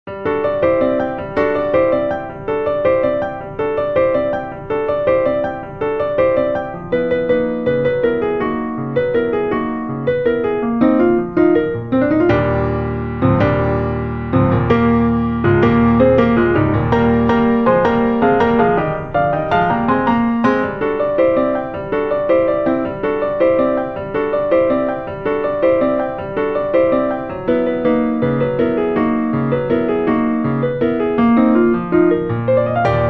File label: Madrigals accompaniment; Three Madrigals; O Mistress mine